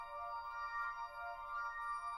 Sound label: intro LOOP; fairy mirror